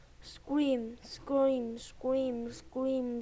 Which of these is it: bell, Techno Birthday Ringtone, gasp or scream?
scream